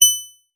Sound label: pickup gold; hyperrogue